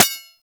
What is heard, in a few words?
Bullet Impact Generic Metal; Warframe